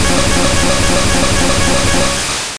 Thunder Wave Direct; Pokemon; Gen 1; attack moves; RBY

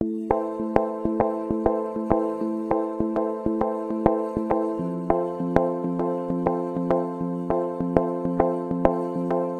Prom Piano; Remember Me

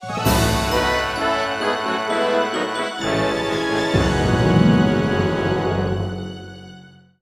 Jingle Op Fanfale; A-Train City Simulator; A-Ressha de Ikou 3D; 3DS

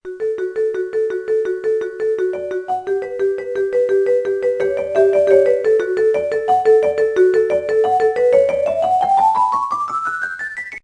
Loops - Xylo; Scratch

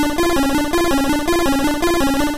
Meditate

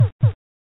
heartbeat 8b; Lucius Demake

